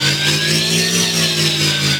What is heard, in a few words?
Target Arm Movement; Venus Open World; Objective Rooms